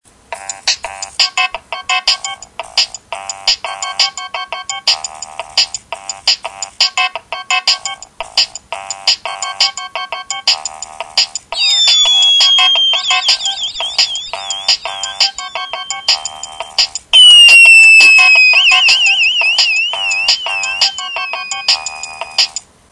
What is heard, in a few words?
Whistle